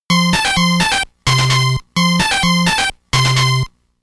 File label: Battle Victory; Ninja JaJaMaru Collection Original Soundtrack